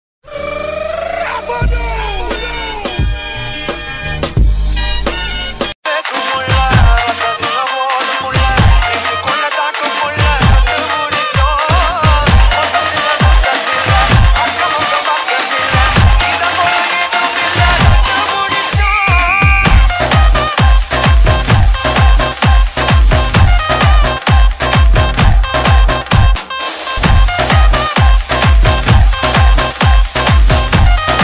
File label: remix ringtone